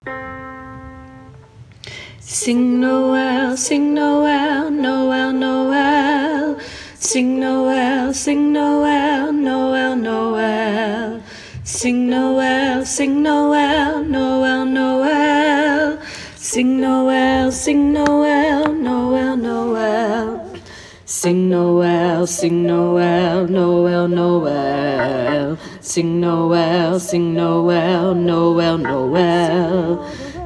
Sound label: Bass Lead